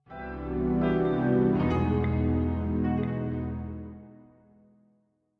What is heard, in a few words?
Completed; Rocksmith